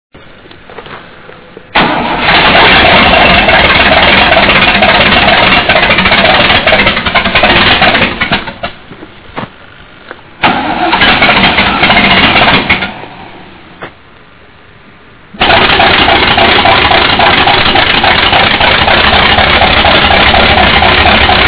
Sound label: start, v8 micra; magicspanner